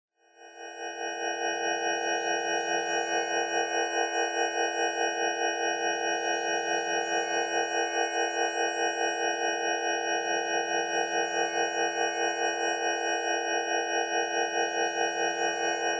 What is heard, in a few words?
herpes simplex rife